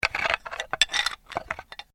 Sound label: Bones Human Move